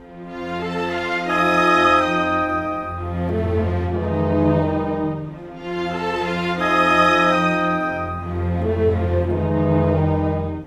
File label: Cinematic, Orchestral Series; Cinematic 06